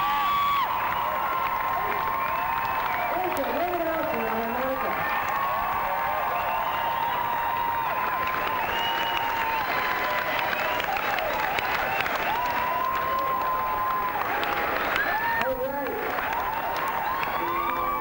crowd

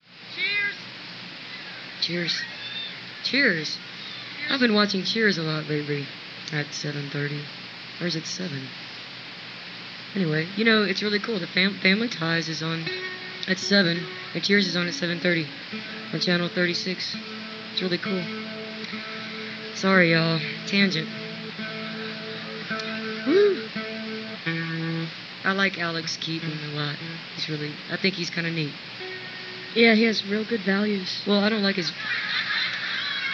cheers, crowd talk

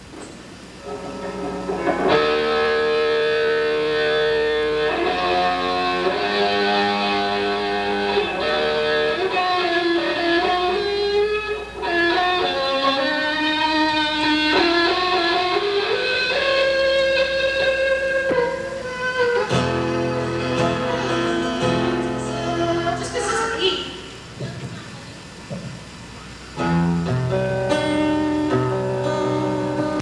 soundcheck

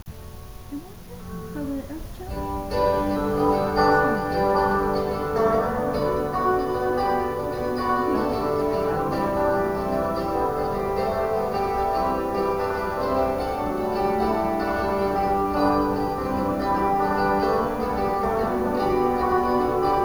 soundcheck

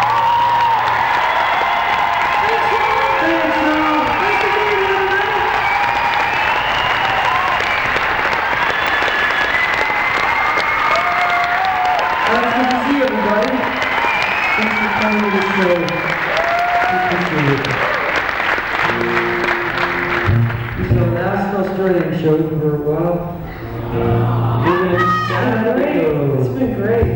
crowd

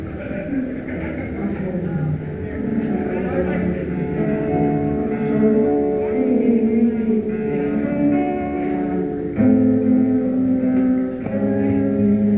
soundcheck

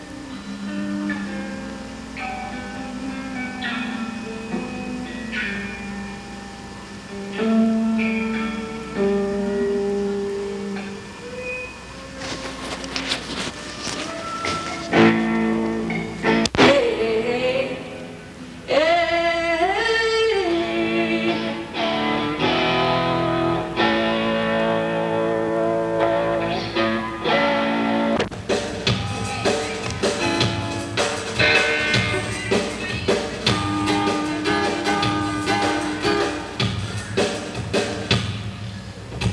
soundcheck

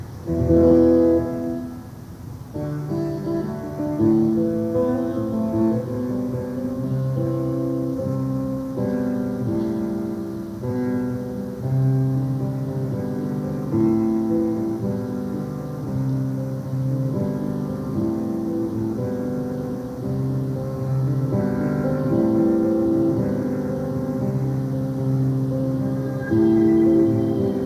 soundcheck

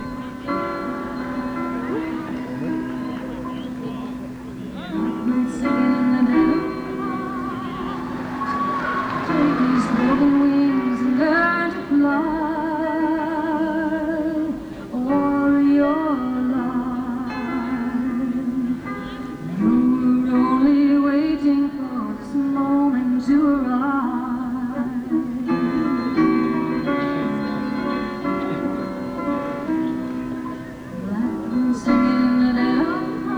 blackbird

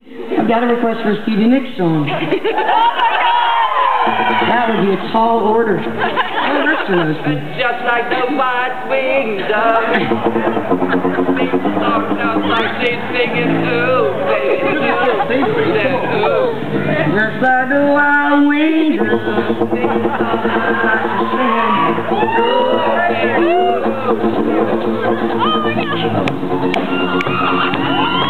crowd talk karaoke

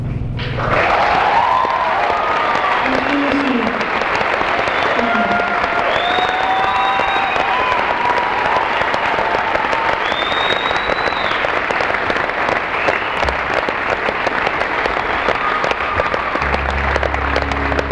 crowd

